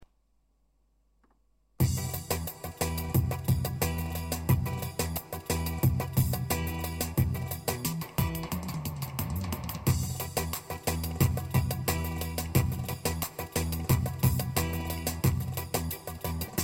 pa-500 tr ciftetelli; korg pa 500 turkiye

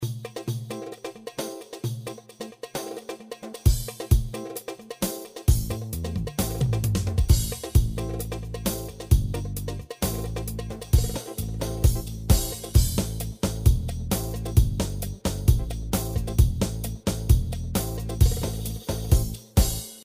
sebare, yamaha psr a300tr v2 ritm

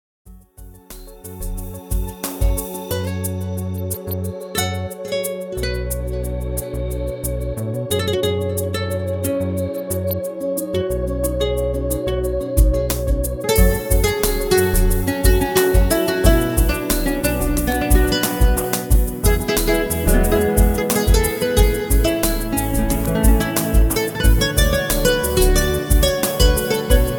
gitar dinletisi; roland gw-8

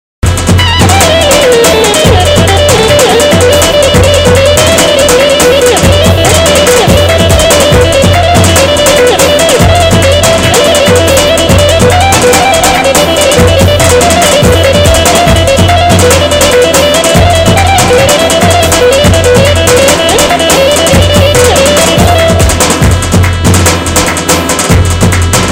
aksak 9 8, tyros 2 aksak roman

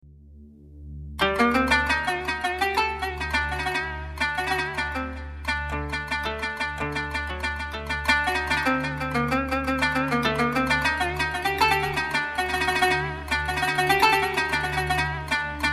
kanun keman solo; korg pa55 tr orjinal

